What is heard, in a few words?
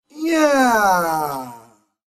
yeehhhh